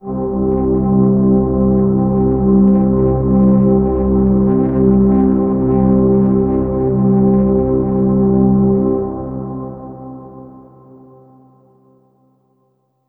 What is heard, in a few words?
Downtown Overlay Drone